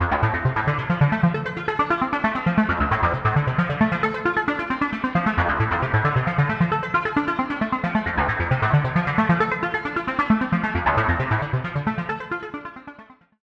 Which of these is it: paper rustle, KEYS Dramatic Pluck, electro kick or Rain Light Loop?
KEYS Dramatic Pluck